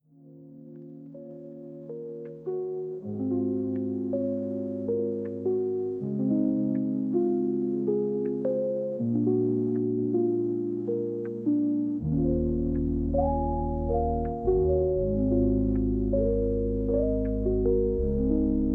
Chill Hip Hop Beats, Soft Raindrops

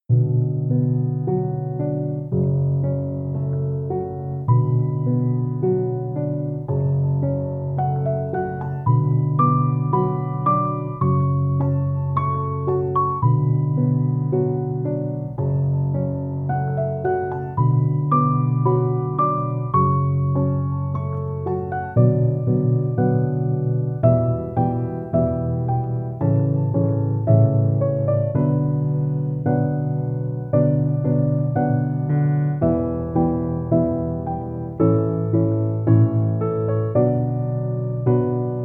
Classical Piano Music

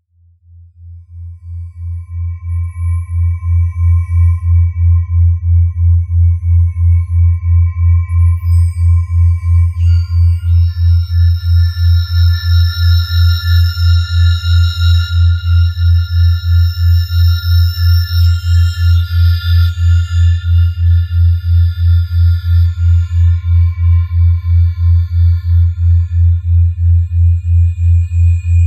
Binaural Beats Delta Bell

